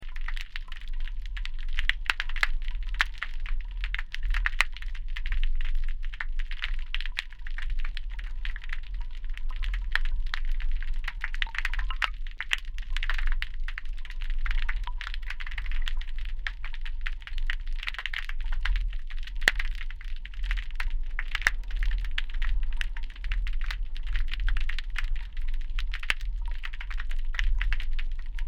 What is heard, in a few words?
Noosa Bridge Snapping Shrimp